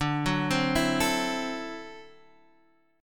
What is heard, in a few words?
D 9th D down Guitar Half Step Down